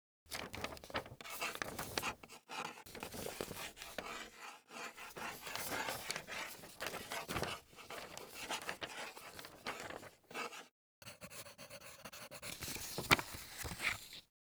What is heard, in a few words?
Note Writing